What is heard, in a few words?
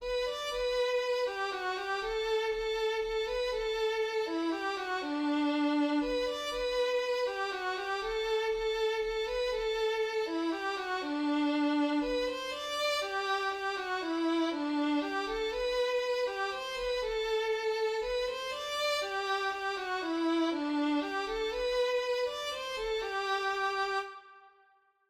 mazurka